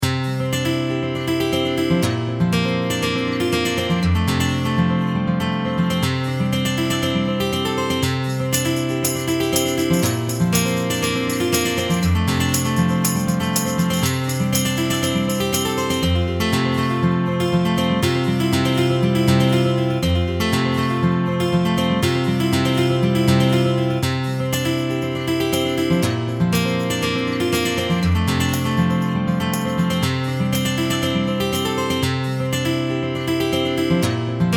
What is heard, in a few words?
Southern Rock Guiter